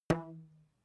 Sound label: rubber band pluck short